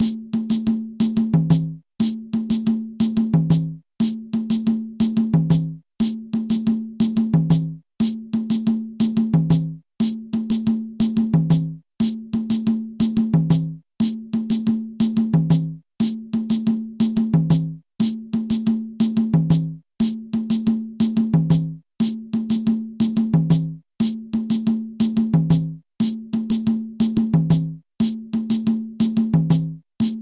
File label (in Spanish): abakua matanzas kuchi yerema